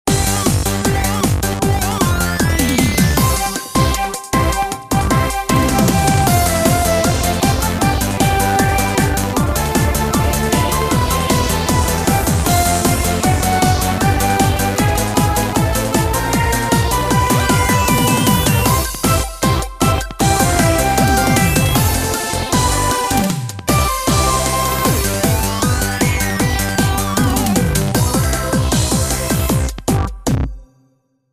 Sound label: Music event; Music Game Eikan